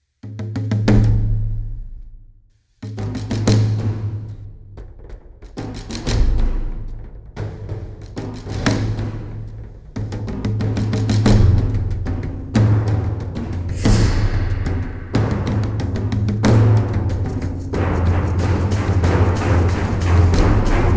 combat

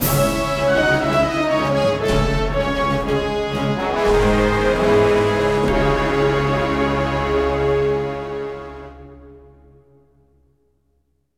Combat Lose; Combat Reg5 Lose 03

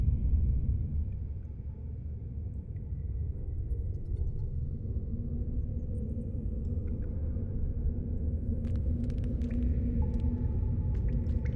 Cave Ambience; The Children of Clay

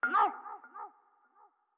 Hurt; StyxMech

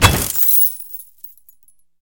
Lockbreak; The Binding of Isaac

